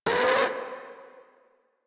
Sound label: Catbrow Combat Vocal Pain; Warframe